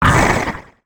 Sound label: damage; Barotrauma; Barotraumatic; NightmareCreatures; StationCreatures; Husksprinterhumanold